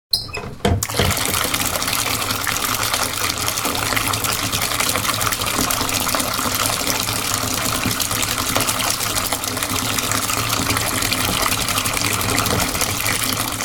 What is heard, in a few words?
Running Bath, Turning the taps on an filling a bath full of water.; Bathroom; Household